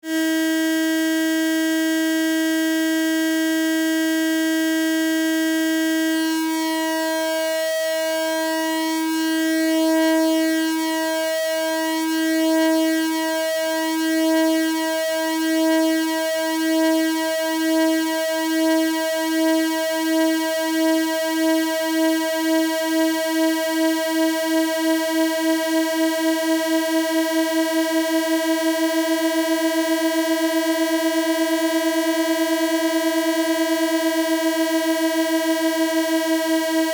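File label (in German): verstimmen sägezahn; harmonie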